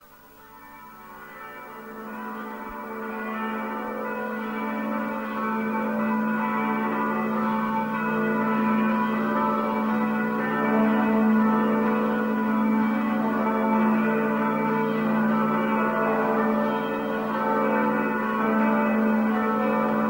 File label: chimes; christian